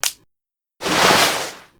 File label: reveal; Hugh